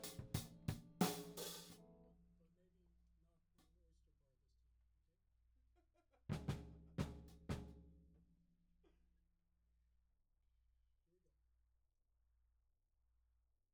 Tom1

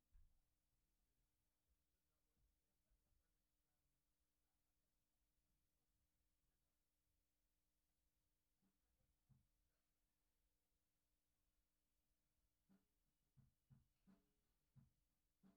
GTR R84

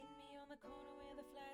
Act Guit R

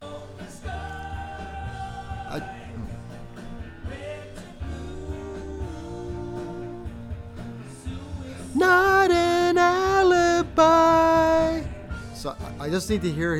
VOX Back

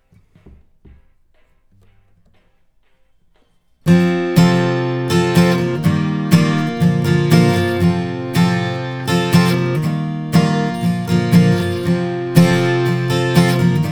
Ac GTR

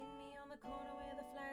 Act Guit L

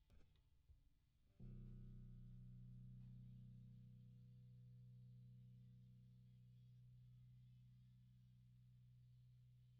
Bass DI

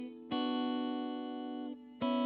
GTR

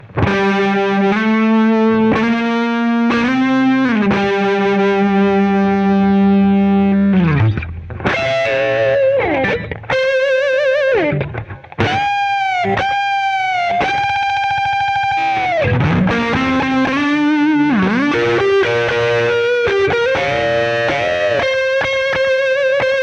Solo Gtr

